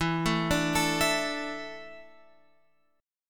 E 7th Suspended 4th, Guitar, Open F; E7sus4; arpeggiated; x,11,9,9,12,11

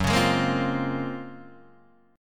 F sharp Major Sharp 11th, Guitar DADGAD; chordshape; down

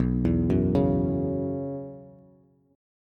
B Suspended 2nd Suspended 4th, Bass; arpeggiated; JGuitar

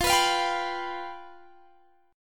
F Suspended 2nd Flat 5th, Guitar, Standard; strummed; x,20,21,22,20,19; JGuitar

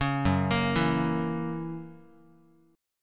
Gb 7th Gb down Guitar, chord

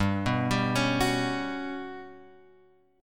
G Major 7th Flat 5th; GM7b5 Chord {3 2 X 0 2 2} arpeggiated

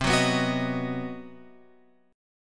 C Minor Major 7th Flat 5th, chord; CmM7b5 Chord {8 9 9 11 X 11} strummed

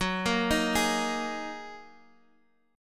Gb 6th Add 9th Gb down Guitar Open G

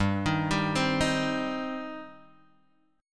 G Suspended 4th Sharp 5th G down Guitar Open G

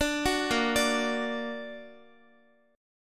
Bb Augmented Bb Guitar; arpeggiated; JGuitar

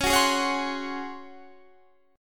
C sharp Major 7th Suspended 4th, Guitar, Standard; strummed; chord; 21,21,18,18,19,20; JGuitar

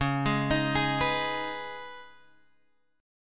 C sharp 7th Suspended 2nd, Guitar, Open G; down; chord shape

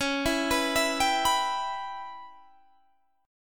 C sharp Minor 7th Flat 5th, Guitar, Standard; C#m7b5 arpeggiated; 21,19,21,21,20,19; JGuitar

